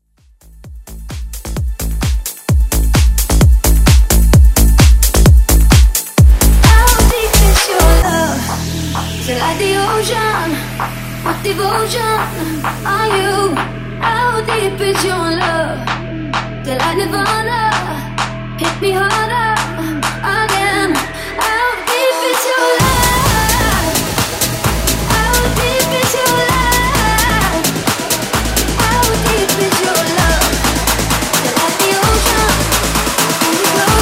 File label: Vocal Drop